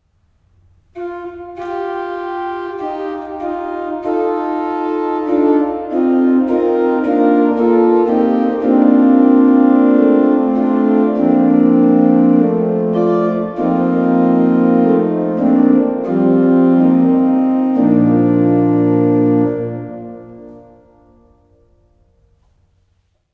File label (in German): ein danklied sei dem herrn orgelvorspiel